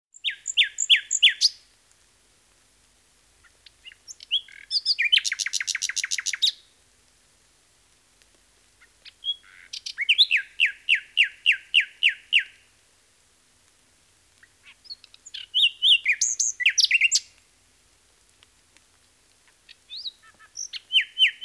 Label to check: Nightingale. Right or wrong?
Right